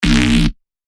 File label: Bass Shots C; Tao; revealed bass shots vol 4